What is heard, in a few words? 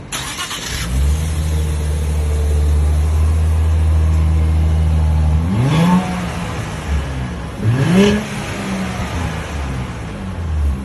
nissan z